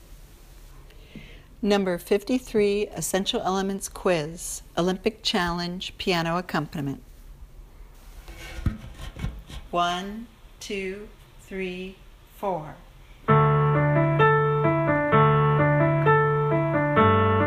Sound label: piano